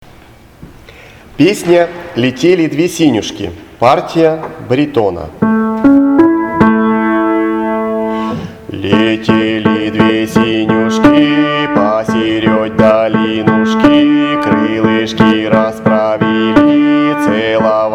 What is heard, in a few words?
Leteli dve siniushki Baritone, ruschoir